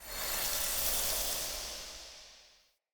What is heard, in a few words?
UI Action Hold Start; Nightfold